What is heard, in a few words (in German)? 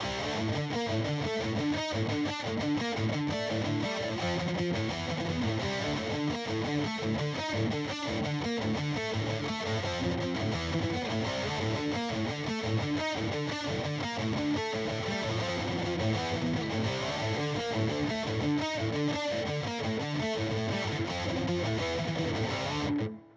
Boss GT-1000 Core Dual Rectifier Kompressor